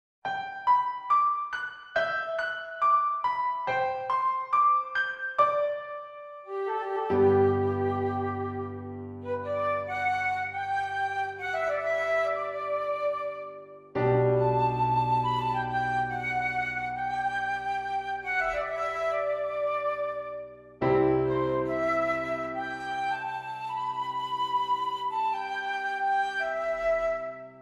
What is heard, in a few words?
Flute and Piano